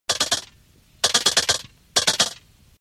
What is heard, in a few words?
Typewriter